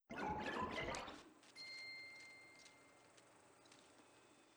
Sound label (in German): raum eintreten; battleblock theater